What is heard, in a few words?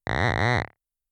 frog voice